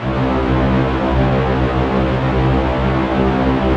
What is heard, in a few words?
SPACE STRINGS, Yamaha VP-1; Music Store